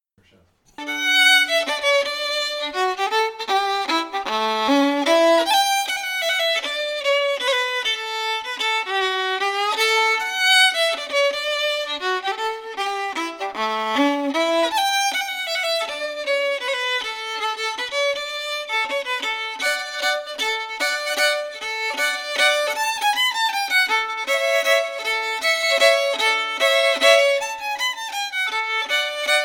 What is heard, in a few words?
waltz fiddle; mrs kennys waltz; tune tutor; SeamusGrantFestival